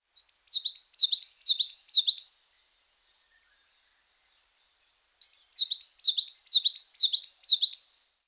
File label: higara, birds